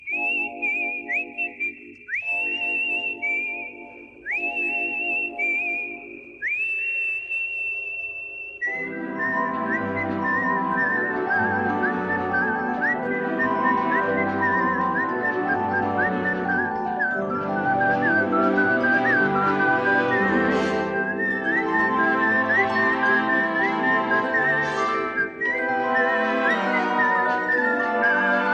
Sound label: Ringtone Whistle; American Horror Story